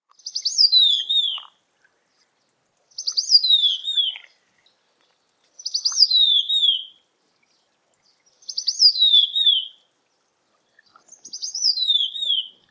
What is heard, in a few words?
Streaked Laughingthrush calls; Maenam Wildlife Sanctuary Ravangla Subdivision South Sikkim